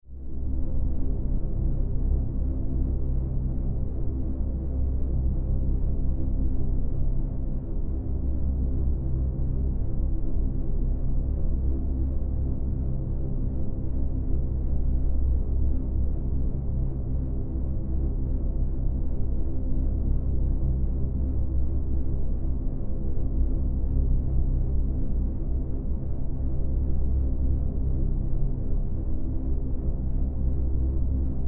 Drone Scifi Tonal Throbbing Propulsion System; Submarine Spacecraft Science Fiction; Futuristic; Sound Design

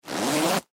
Clothes Pants Zip, Pants Zipper Zip Up; Zippers; Foley

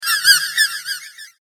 Alien Birds; Alien Sound Effects; science fiction sound effect; Andrew Fleming

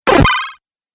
8 Bit Aggresive Laser Bleep; Video Game Sound Effects

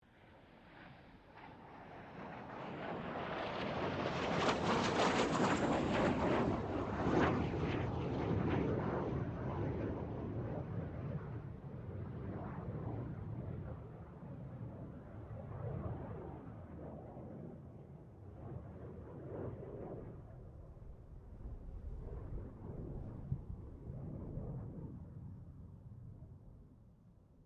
FA-18 Hornet pass; RAAF FA-18 Hornet; Airplanes

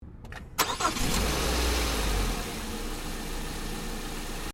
Car Ford Focus Ignition Engine Bay; Car Ford Focus 20 09; Ford Focus hire car; Cars (Sound Effects:Vehicles)

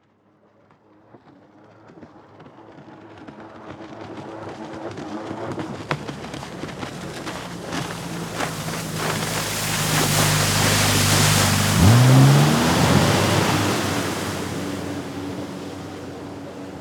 Jet Ski Away, Watercraft; Transportation; Pull, Up, Drive, Off, Fast; Boat Sound Effects

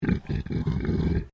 Animal Pig Landrace Growl; Edgar; Edgar's Mission Farm Victoria; Pigs; Animals

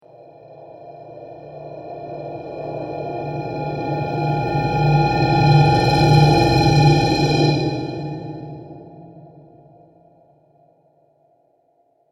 A Dark And Evil Aura, Scary Soundscape; horror sound effect; Andrew Fleming

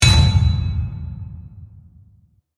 Hit Metallic Slam, Ring; Production, Element, Imaging, Accent, Transition

